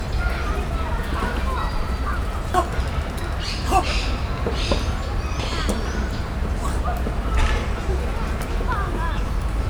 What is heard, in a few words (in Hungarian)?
tüskésnyakú íbisz; debreceni zoo 2019